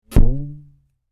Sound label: Cartoon Rubber Band Stretch Twhap; Miscellaneous Cartoon